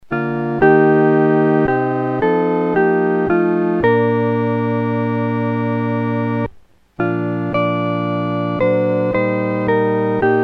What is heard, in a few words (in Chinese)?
合奏 四声部